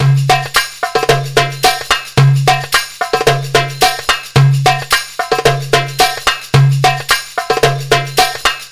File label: PERLP, ArabianTraditions